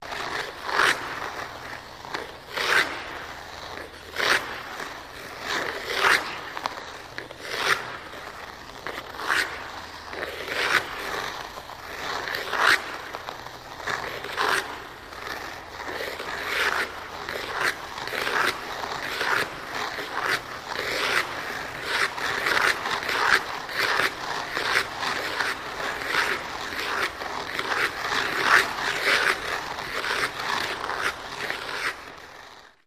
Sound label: Ice Skating Steady Ba